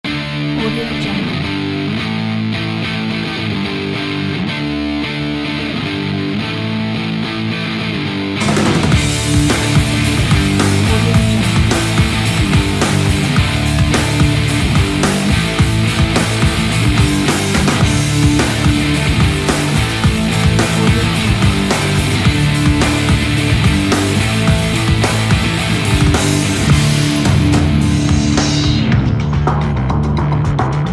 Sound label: punky american skater; mordastudio